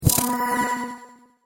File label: Wizard Aura Spell, magic sound effects